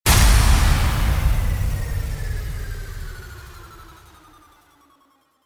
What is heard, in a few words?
IMPACT